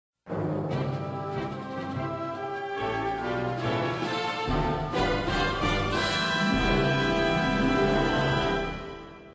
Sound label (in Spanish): Tema de victoria; Fire Emblem; SSBM